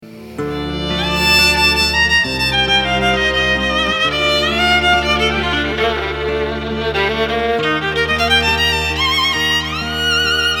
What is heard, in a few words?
Mizmor Violin Viola improvised solo; Beshir